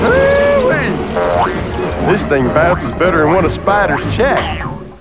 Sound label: Bounces